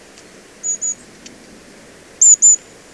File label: Aegithalos caudatus